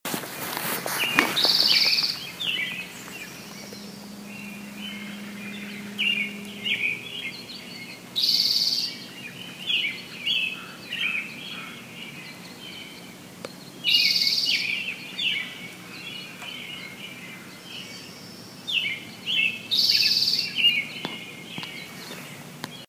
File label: morning bird